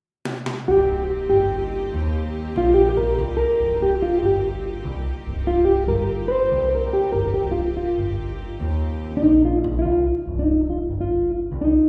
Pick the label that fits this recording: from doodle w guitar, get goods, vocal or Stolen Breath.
from doodle w guitar